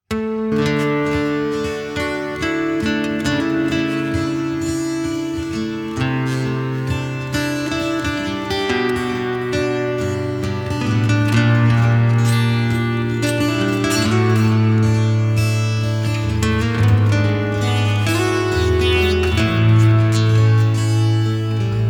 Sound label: instrumental